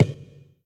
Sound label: soccer bounce; HeavenStudio; double Date